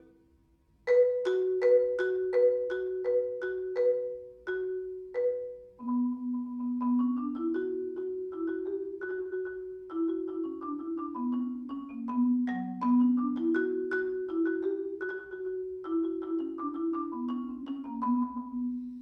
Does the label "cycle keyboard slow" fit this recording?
Yes